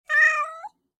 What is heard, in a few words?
Meows x 5; LUCIFER; Wearables